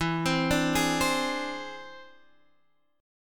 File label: E Augmented 7th E down Guitar Standard; E+7 arpeggiated; JGuitar